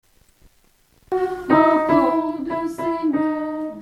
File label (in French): basse